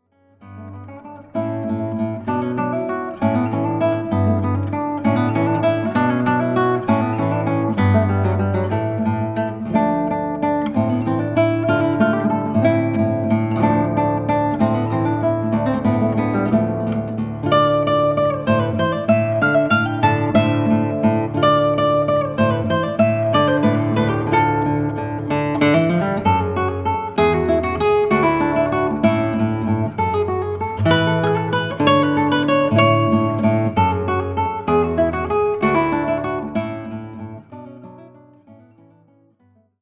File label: Classical guitar